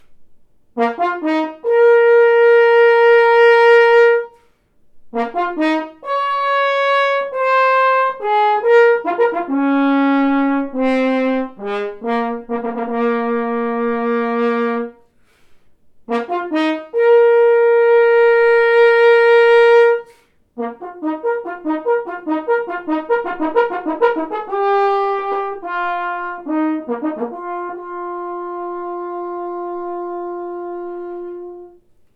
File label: hand hammered bell, Horn; spun vs hand hammered bell flares; Engelbert Schmid